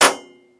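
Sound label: Metal Solid Impact Bullet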